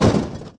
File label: crash; Wacky Races - Sling Races; NextlevelGames